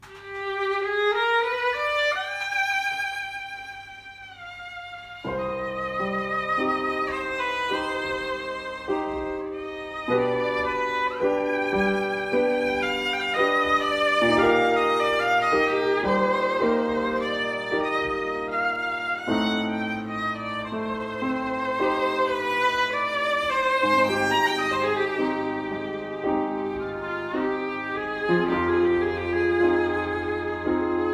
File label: Etude Cmin